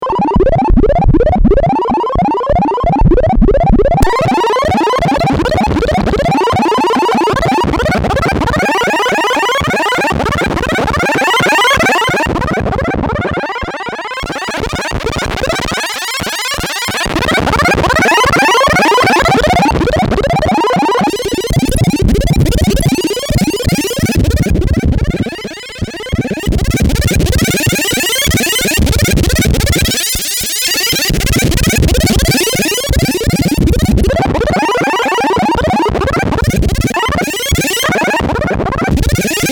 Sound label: synth